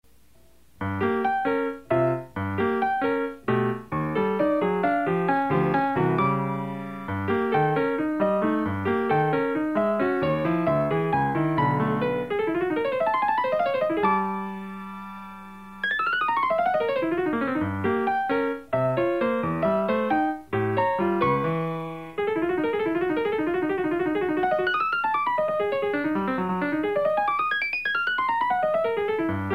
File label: piano